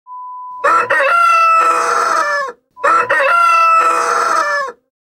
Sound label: Rooster sound